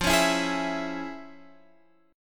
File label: F Minor Major 7th Sharp 5th Guitar Open F; strummed; JGuitar